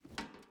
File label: metal jump; teardown ps5 windows xbox series xs gamerip 2022